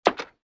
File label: button; Soldier Of Fortune II Double Helix; XBOX; movers buttons